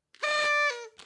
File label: Party Horn; GameVsJam